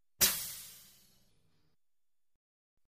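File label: Respirator Air Exhale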